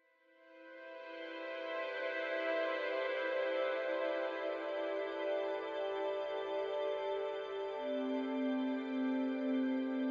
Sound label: thriller